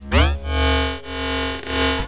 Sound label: break